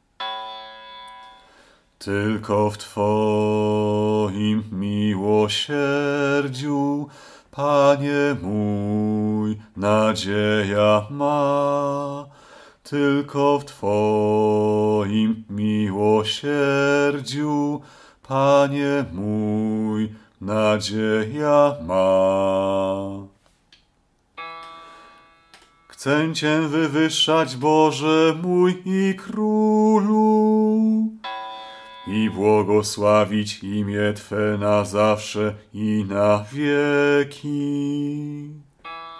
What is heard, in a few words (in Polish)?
bas; Tylko w Twoim milosierdziu